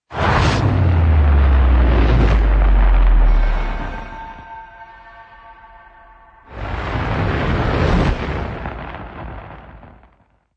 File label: Eternal Flame Logo Fire Only W Sub